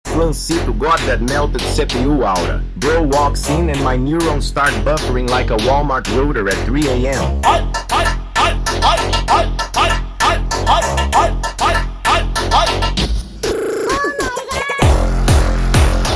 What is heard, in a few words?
Hardstyle 8d